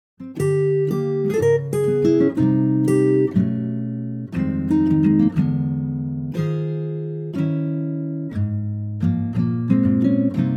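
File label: acoustic guitar Ab, backing track; key - Ab - vocal range - Ab to Db; Wonderful acoustic guitar arrangement of this gem from "My Fair Lady".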